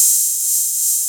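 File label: 808 open hi-hat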